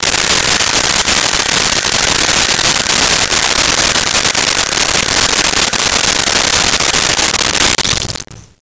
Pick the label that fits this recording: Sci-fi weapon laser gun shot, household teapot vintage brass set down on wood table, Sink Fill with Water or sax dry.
Sink Fill with Water